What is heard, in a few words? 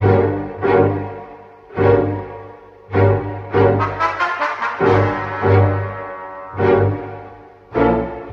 strings and horns, Sad; loops